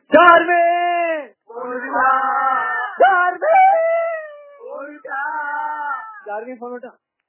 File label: phone